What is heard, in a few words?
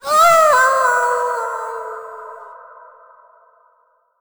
death; Crypt of the NecroDancer